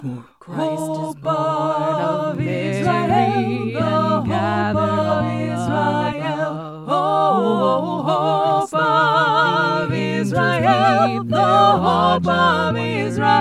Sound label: O Little Town of Bethlehem SATB